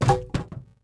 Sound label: club hit metal; objects; Star Wars Obi-Wan; XBOX